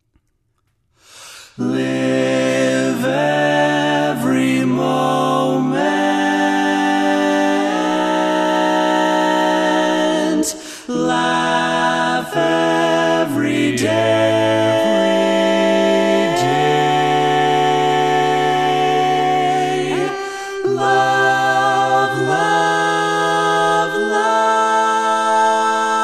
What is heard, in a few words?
Lead, barbershop tags